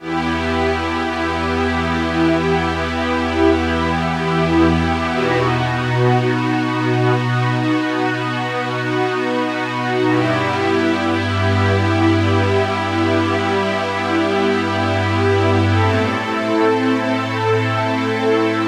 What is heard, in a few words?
Roland JX-1 SYN STRINGS A